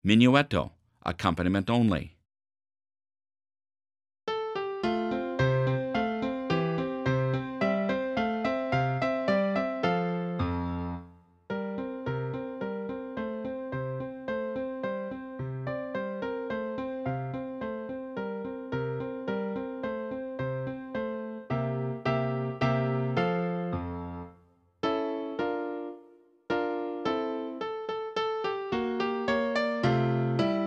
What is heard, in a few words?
minuetto accompaniment only